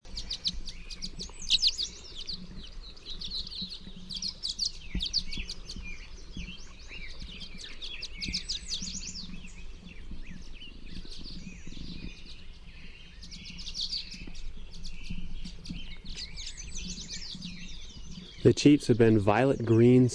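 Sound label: Violet-green Swallow chee chee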